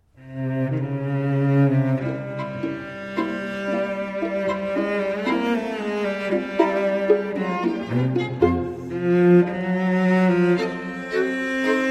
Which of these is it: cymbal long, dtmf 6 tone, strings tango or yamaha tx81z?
strings tango